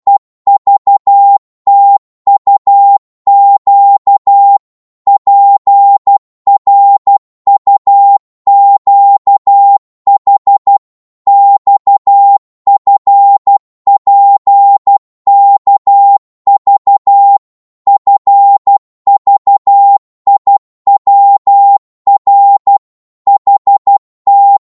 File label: 12wpm, Morse